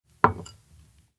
cookoff nikujaga plate; fumiya; anemoi

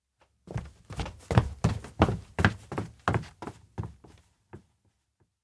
steps indoor kitchen move fast; anemoi パッケージ版 1.1

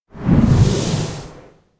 elec plane hover stop; anemoi

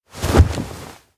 situp startle; anemoi

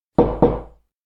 knock tap; anemoi

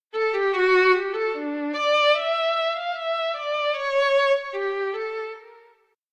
elec violin intro; anemoi

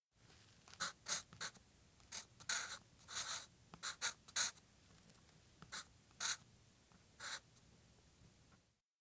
note write; anemoi

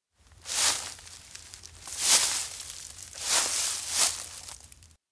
steps outdoor ranch approach horse straw; anemoi

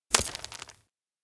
collar snap; anemoi

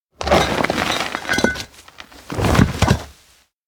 lift worship stone heavy; anemoi

